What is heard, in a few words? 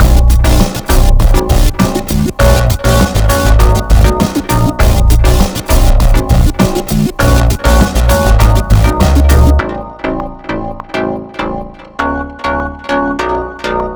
Suspense